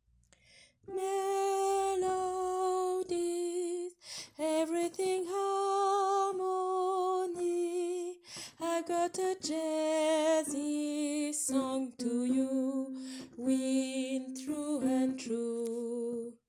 basses melodies

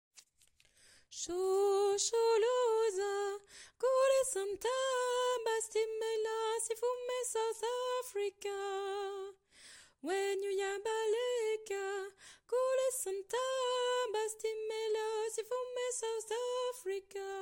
Shosholoza, S; Soprane

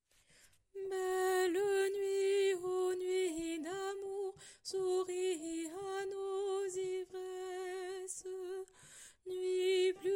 Barcarolle; sopranes; Mesures 1 à 28

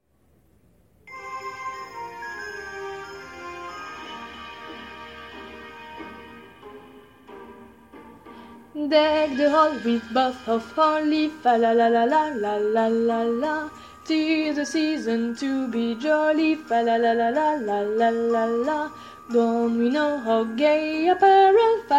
Deck the Hall voix

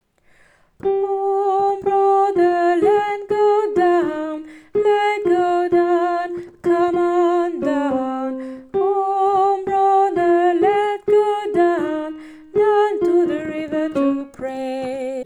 Down to the river (alto)

